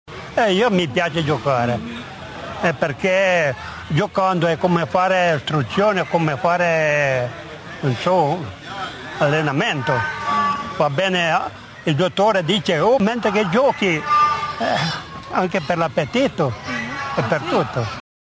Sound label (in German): boccia